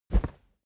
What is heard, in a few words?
whoosh cloth soft dry; swooshes-swishes